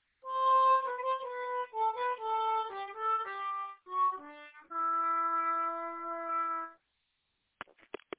weird dark vibe melody tail

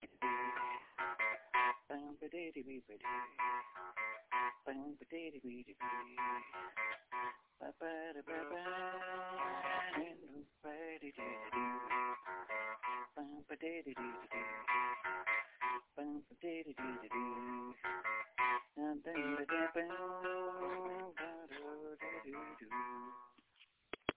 sneaking up all blusey and stuff

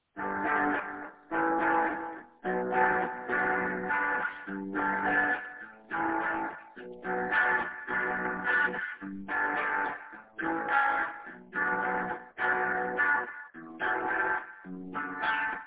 chorus

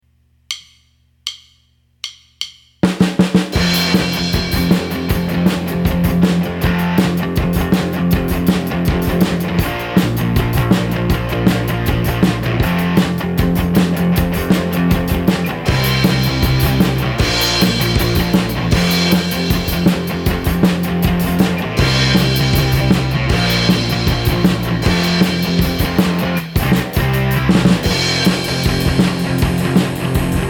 soundcheck

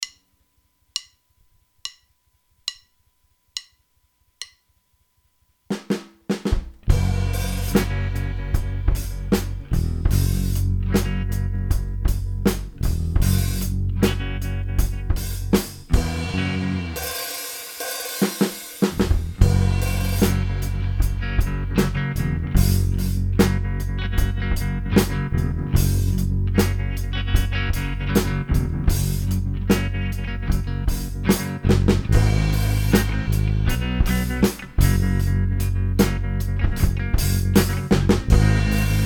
backing Track

